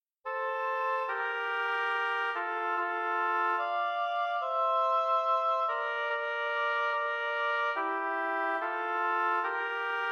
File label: oboe duet; Which F do i use oboe method